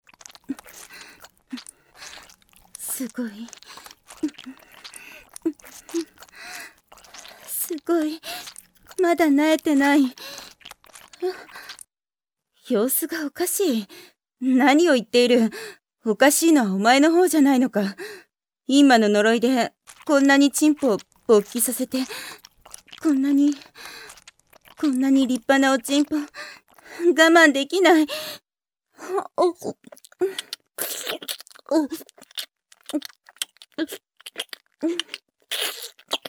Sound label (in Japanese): H音のみ